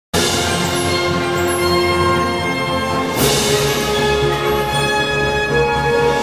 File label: Loop